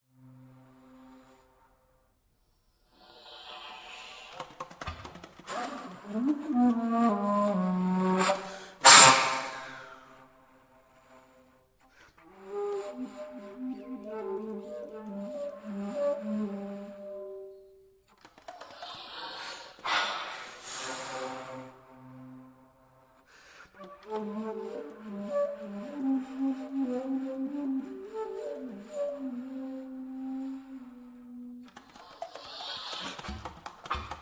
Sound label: streamforms for solo bass flute